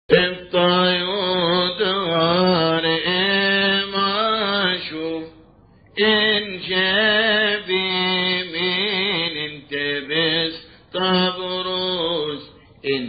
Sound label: Gospel Response; Feast of the Cross